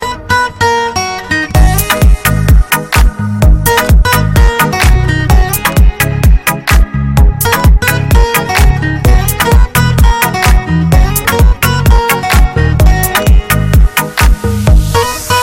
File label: New age guitar